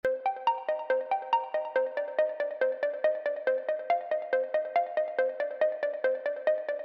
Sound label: Arp